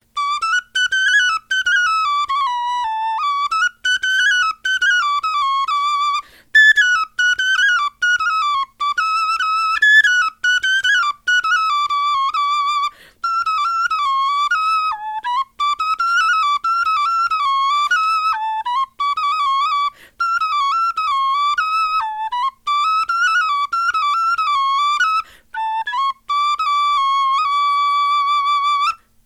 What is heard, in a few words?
melodie, knochenfloeten